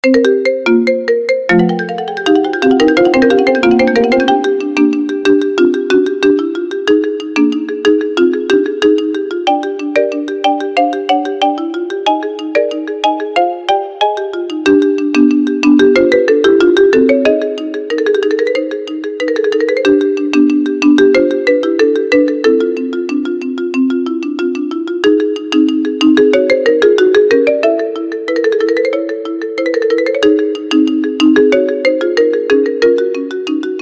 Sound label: Battle Theme Marimba; Gold Silver Pokemon